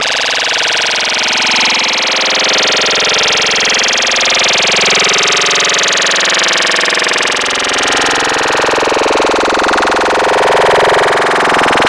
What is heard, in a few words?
Female Throwup; Wavetables; Cymatics; Vomit for Serum Vol 2